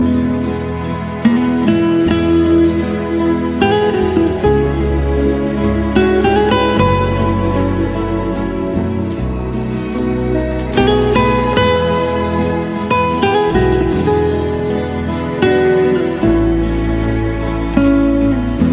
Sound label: Relaxing Sms